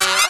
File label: RIFFFFFF, Hip Hop; E-MU Formula 4000 Series Vol. 1; Hip Hop Nation